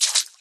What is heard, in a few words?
footstep water; Scrat